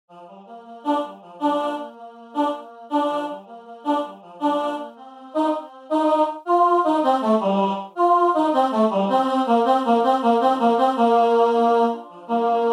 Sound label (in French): tenor part